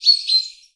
Birdsong Bit, Oxford Island Lough Neagh

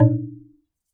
Wooden Bar Strike

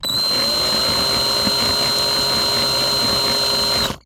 Drill

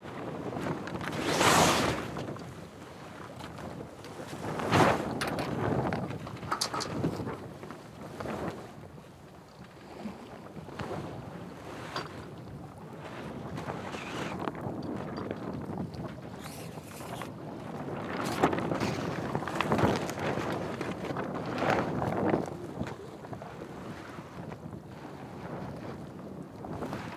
Sailing

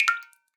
Drips

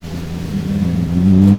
Snare Drum; Percussion Samples

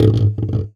Classical Guitar Extended Technique

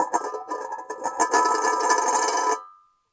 Coin On Collander

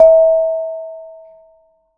Bonang P, Gamelan

